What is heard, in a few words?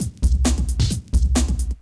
Drum, Breakbeat